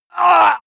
male hit

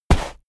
step walk sand; schritte

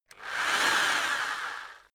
rock drill rev reverse medium; maschinen; bohrer

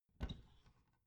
bathroom cabinet door creak open regular

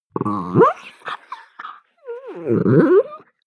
chihuahua growl whimper; natur-tiere; hunde

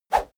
whoosh small; swooshes-swishes

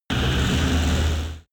extrinsic drone buzz; energie

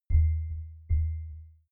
button deep drum chink; maschinen; signale

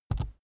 keyboard laptop enter press fast soft

